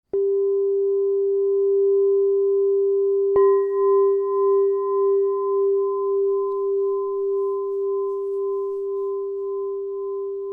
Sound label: Crystal Tones Singing Bowls; Crystal Tones Alchemy Singing Bowls